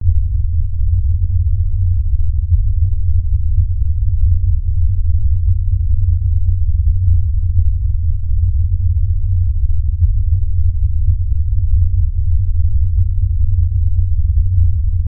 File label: Low End Rumble Loop